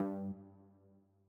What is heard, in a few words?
Classic UI SFX Short Low; gameflow-deck